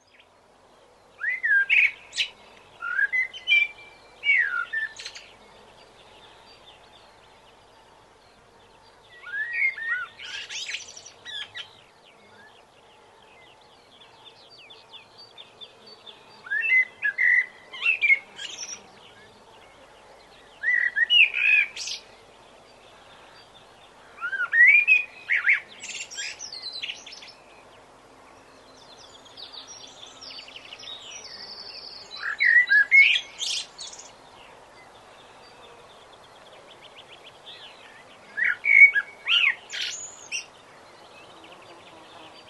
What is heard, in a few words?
Birdsong Blackbird